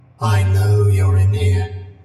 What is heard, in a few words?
cheers; 049; scp sf